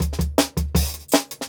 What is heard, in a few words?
160 Tam Tam, Jungle Breaks; Original Jungle Breaks